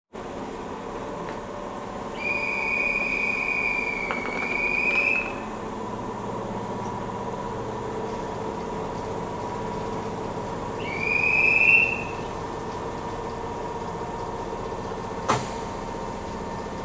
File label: keisei 3541